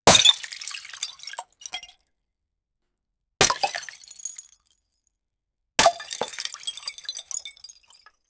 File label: broken glass bottle